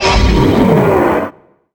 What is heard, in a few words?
Rush